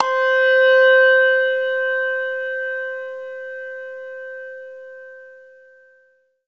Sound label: handbell